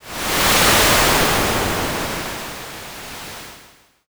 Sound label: wind